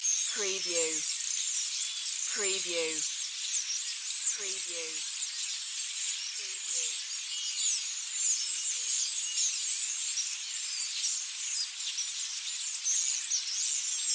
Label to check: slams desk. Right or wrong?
Wrong